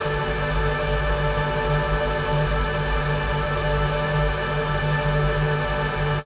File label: granular; pad